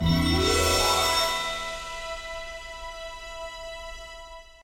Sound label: music cookie complete; Dorothy And The Wizard Of Oz - Cookie Magic